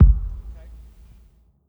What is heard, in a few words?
Cave Kick